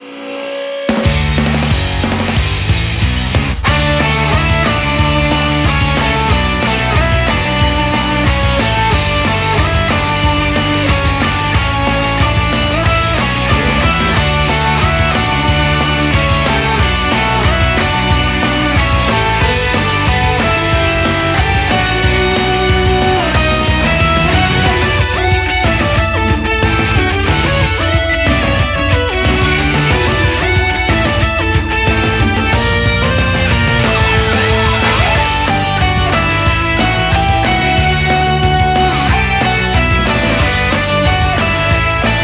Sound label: Bonus; pachinko cr rookies